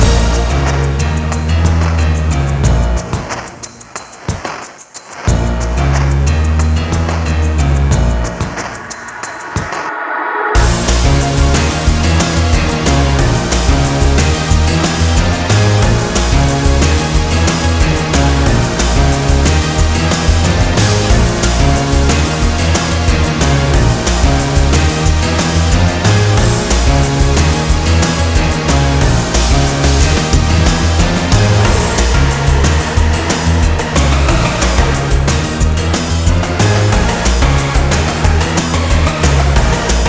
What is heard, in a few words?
bgm boss